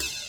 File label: KIT, Dance Mega Drums, HIP